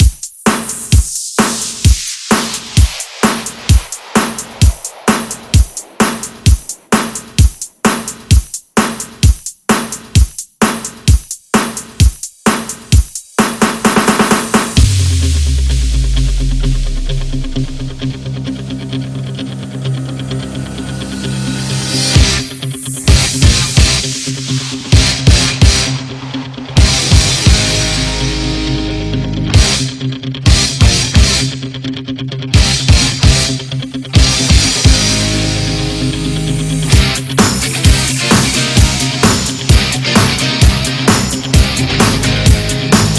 80s Upbeat; Remixfreakz

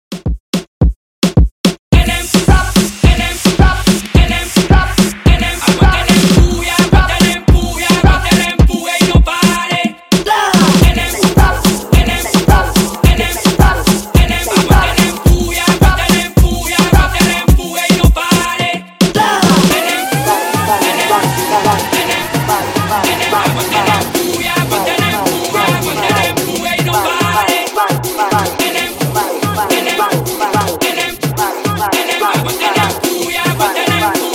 Hot Mombahton Redrum

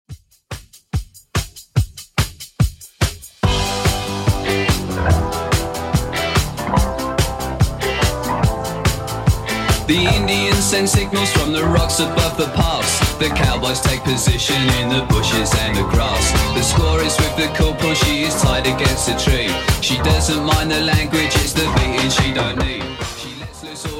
70s Redrum 144bpm

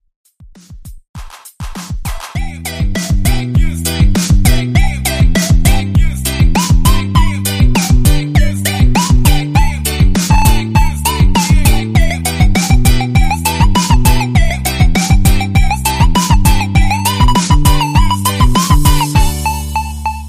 Haus Redrum 100 Bpm

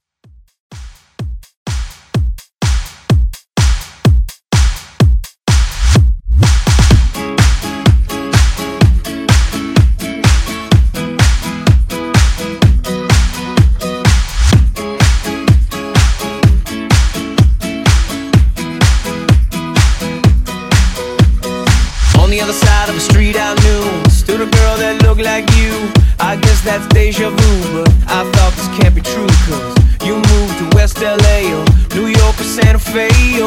Uptempo Redrum

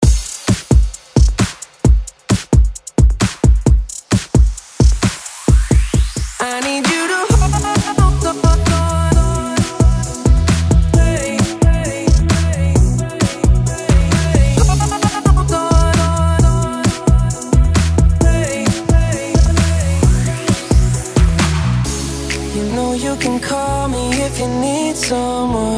breakbeat 132 bpm

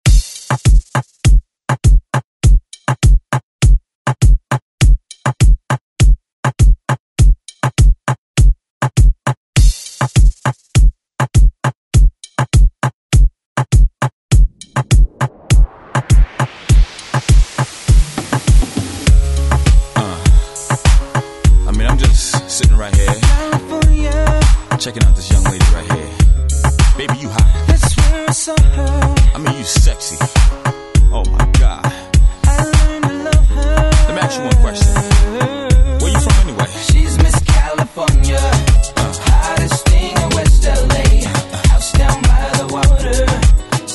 Moombah ReDrum

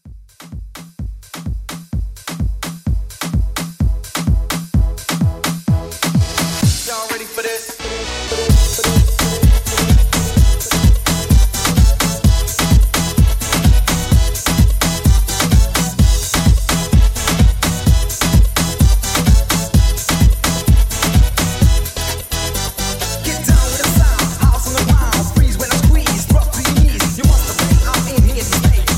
Afrobeat Re-Drum 128

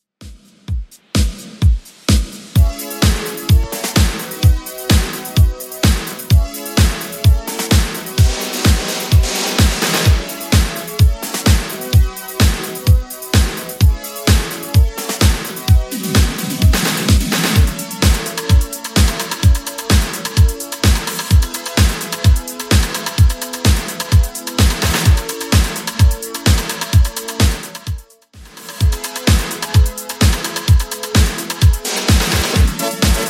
80s Redrum 128bpm

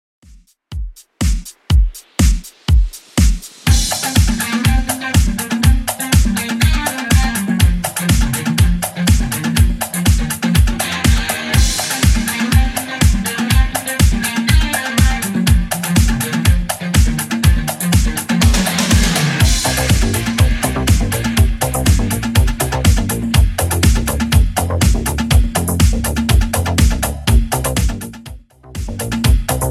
80s Redrum 122bpm

